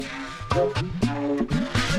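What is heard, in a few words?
Music Base 120; from dust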